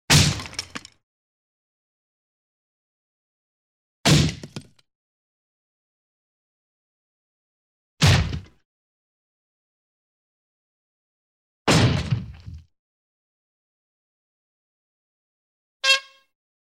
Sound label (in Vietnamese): tieng cu va cham nang khien vat hu hong roi xuong